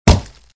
bat imp; StickRPG Combat

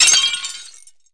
Glass Shatters; Hearts

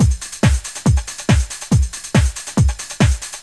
DRUMS

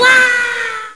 Whah; dithell in space

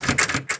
door wood locked; mechanics; Spearhead Demo; MacFormat 2003 June

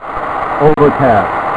snow; M4 7.5.2; M4™ (PowerMac)

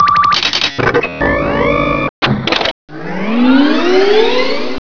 Time Machine Activate; Loony Labyrinth 1.02 Demo; Arcade

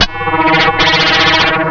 Down Periscope

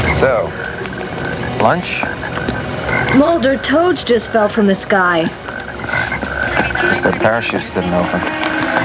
toads; xfiles; x-filesirc

